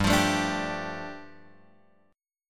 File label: G 7th Suspended 2nd G, Guitar Open F; strummed; JGuitar